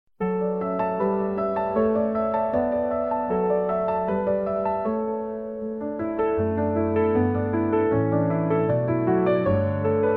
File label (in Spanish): Ambiente, Generado con I.A., Ambiental; Música